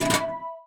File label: Folder Opened; Salem's Sound Schemes